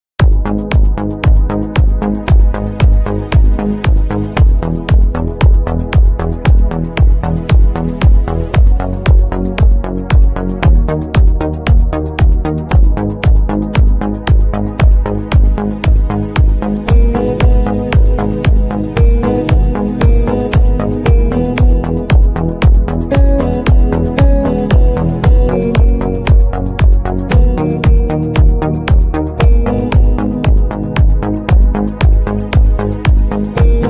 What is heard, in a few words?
songs remixed from the 70s 80s 90s